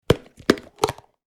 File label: Plastic food container open